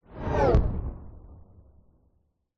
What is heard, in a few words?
science fiction thud hit whoosh